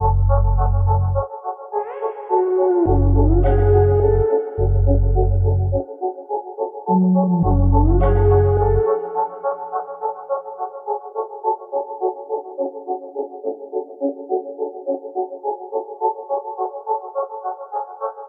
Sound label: 105 BPM F# Minor, Trap Melodies; Apollo; Cymatics; Trap Starter Pack